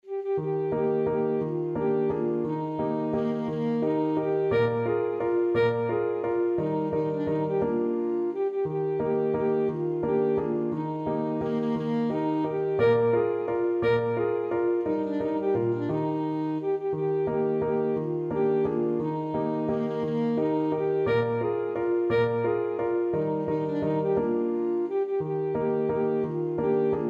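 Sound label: German Dance, alto saxophone; schubert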